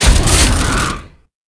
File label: impact powder; Quantum Redshift